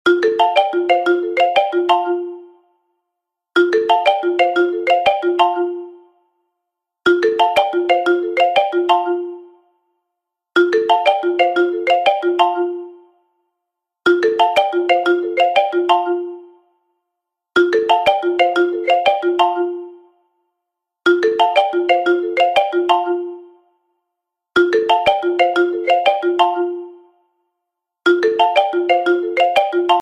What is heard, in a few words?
2nd Line ringtone